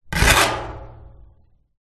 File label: metal scrape resonate garage door; foley